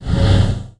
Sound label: drain